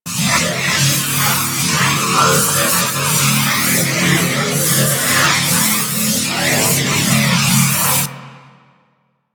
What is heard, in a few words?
Space Fighter Railgun Fire Loop; Space Fighter Charge Agent; Weapons